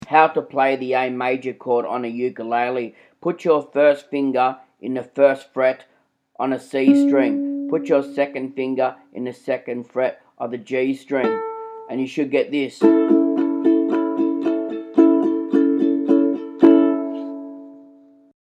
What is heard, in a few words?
A Major Ukulele